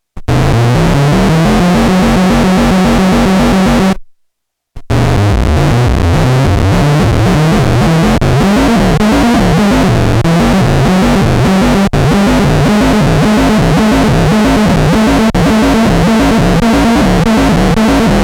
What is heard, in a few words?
Starting Tone